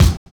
kick, Drum and Bass

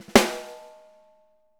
6X12 ALUMNUM, Living Drums

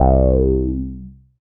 Single Electric Basses, Dance Floor Bass

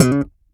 DOPE PLUCK; E-MU Formula 4000 Series Vol. 1; Hip Hop Nation; Big Bottoms

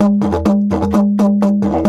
E 130, Didgeridoo

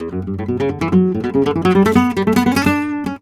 PICADO GTR, Flamenco; Best Service ProSamples vol.46; AKAI

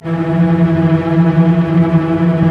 STR, Roland LA Composer

